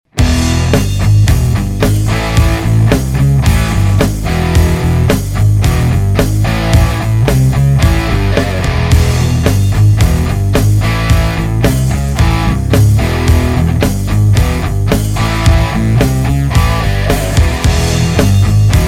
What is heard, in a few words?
Full Crunch